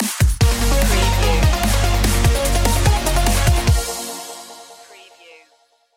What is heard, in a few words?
biodigital future stinger